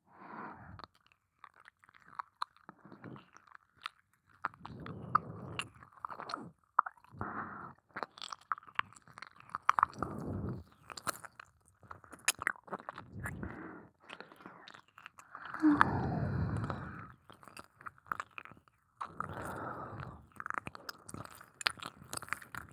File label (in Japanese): 耳舐め・普通・右耳